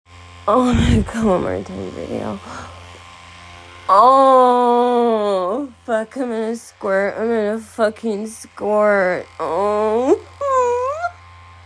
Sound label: soft, whimpers, groans and moans; F4M; third time s a charm